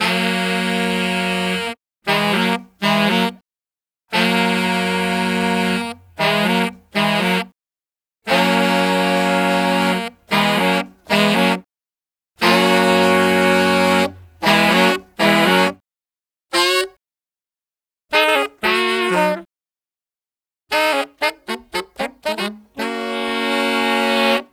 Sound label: Sax Chorus To Verse; Multitrack; Remix Parts